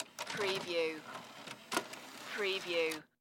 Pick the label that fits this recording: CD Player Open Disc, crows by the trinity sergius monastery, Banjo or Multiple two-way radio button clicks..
CD Player Open Disc